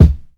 hot kick drum sound g key, kick sound tuned to the G note